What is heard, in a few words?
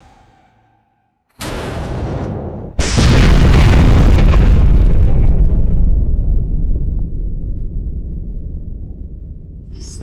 deep metallic explosion with long resonant decay; explosions